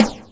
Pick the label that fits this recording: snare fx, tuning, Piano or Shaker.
snare fx